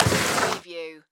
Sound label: Window Slide Open; Sound Effects Bible